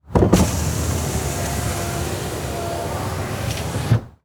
Transportation Truck Envoy Roll Down Window Exterior